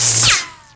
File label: electro; diekrypta